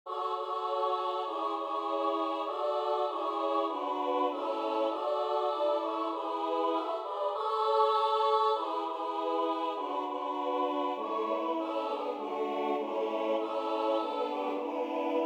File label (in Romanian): Sculati sculati boieri mari, Bucovina, colinde